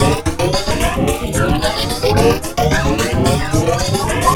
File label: Loop; Sonic Foundry (Sony Creative Software); Esoterik Beatz; Cricket